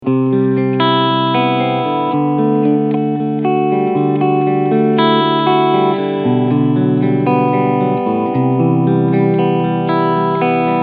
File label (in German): Gibson Les Paul Standard 50s Cleansound beide Pickups